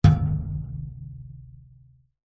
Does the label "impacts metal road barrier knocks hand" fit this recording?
Yes